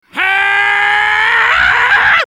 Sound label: haaaaaaaaaaa